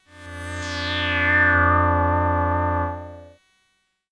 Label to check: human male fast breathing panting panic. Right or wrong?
Wrong